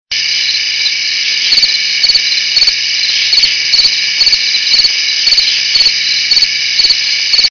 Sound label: loud crickets